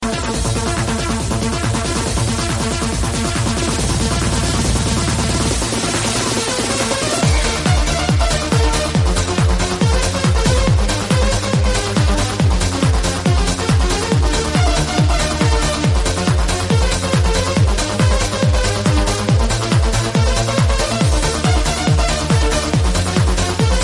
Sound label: remix tone ringtone